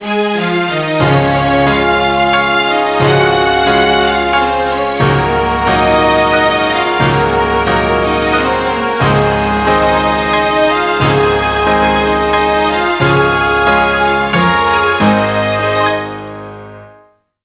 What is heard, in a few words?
Piano concerto